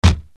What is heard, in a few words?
Yamaha RX7 CAR DOOR